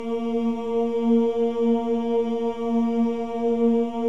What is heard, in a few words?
CHOIR OOS A#3; Spectrasonics; Symphony of Voices